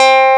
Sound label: DIGWAVE